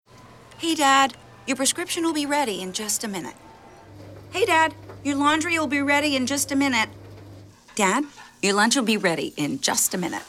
arp

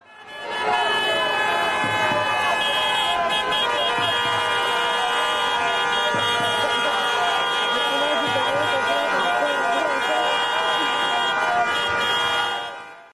Crowd - Police Shooting - Cars Honking; MN Police Shooting

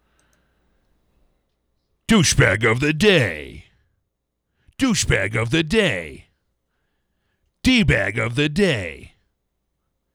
vocal

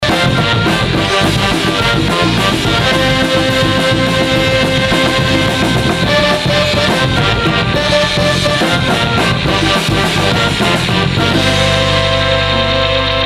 Brass Outro

